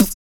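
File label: vox percussion, Vocal Planet Jazz FX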